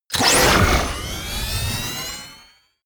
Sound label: sci-fi collect coins medium; hits impacts